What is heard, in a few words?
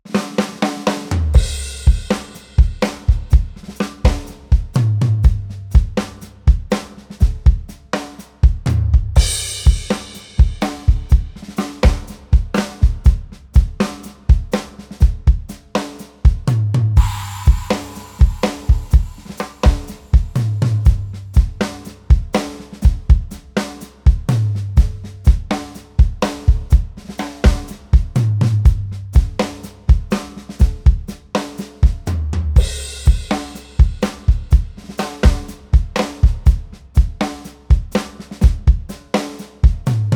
New Orleans Mambo Rock n Roll (123 BPM)